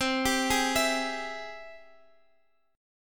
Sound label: G sharp Augmented Major 7th C